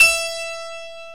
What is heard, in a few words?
HARPSICHORD